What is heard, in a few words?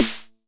909 SD; Goldbaby Tape808 & Tape909; The Tape 909